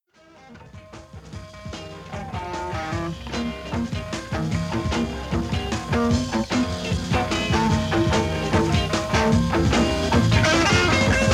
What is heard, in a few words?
Instrumental